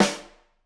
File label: Rim, drum